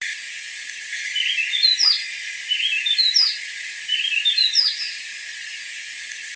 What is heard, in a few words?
White-breasted Wood-Wren